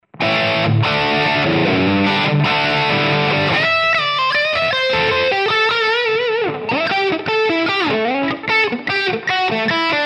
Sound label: solo 100 HU, PODxt Live